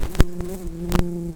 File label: Pascuorum workers, bumblebees